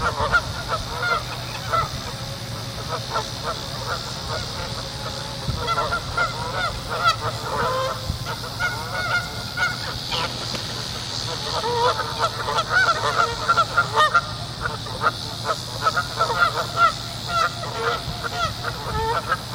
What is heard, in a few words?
canada geese